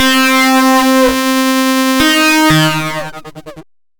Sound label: Saw-Sine Distorted; Retro Noise 3 Instruments